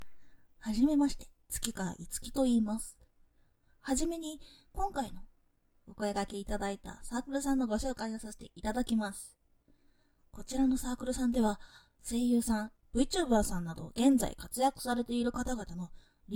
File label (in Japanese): ノイズ除去, ぼくっ娘の新人声優がえっちな汁出しながらクリップ攻め&チクニーも一緒にしちゃうオナニー実演